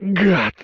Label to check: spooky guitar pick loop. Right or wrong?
Wrong